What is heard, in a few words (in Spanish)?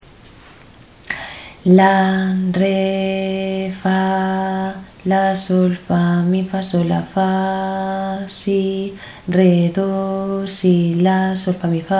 ritmo 6-4